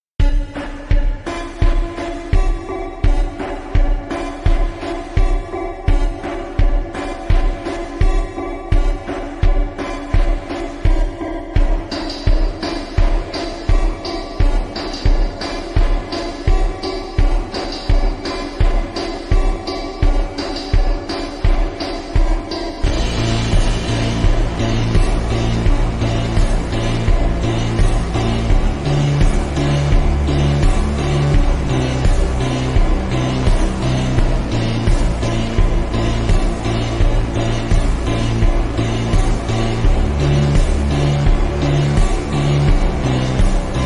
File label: looped slowed and reverb